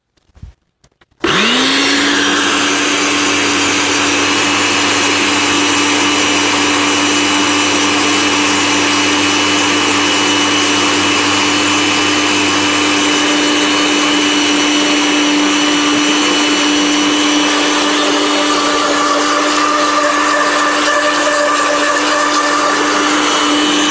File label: blender sfx